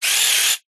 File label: science fiction robot servo motor short burst